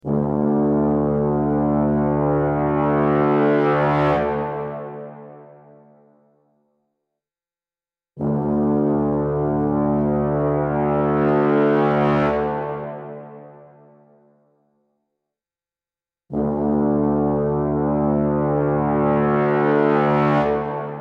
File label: hero soviet march